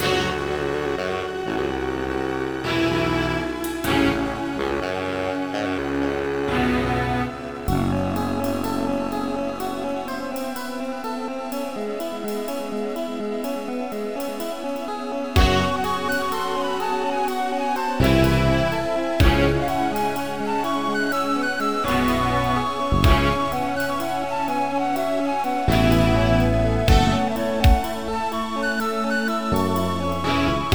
swing

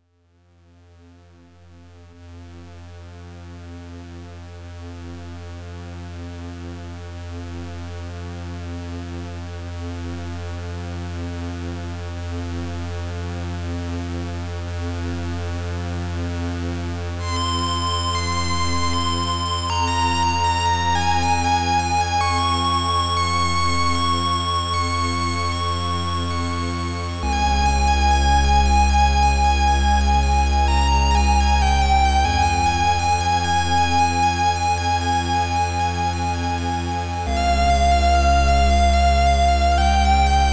trap

